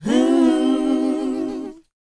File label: WHOA A#, Vocal R&B & Dance; ILIO; Vocal Planet